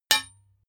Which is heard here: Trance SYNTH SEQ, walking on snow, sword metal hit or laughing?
sword metal hit